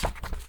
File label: U I Passport